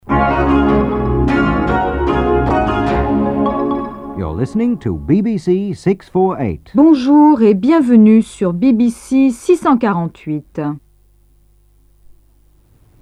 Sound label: Jingle